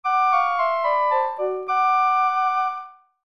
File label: time passes